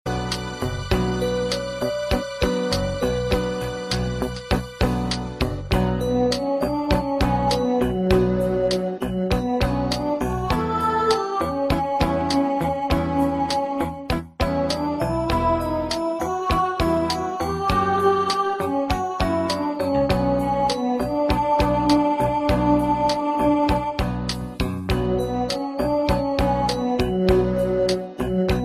soft ring

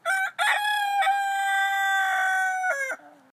Cordon Bleus Crow

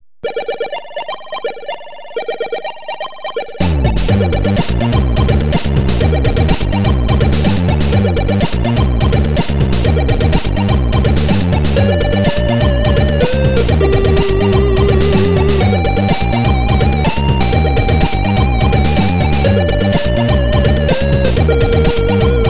Bubbles Tone

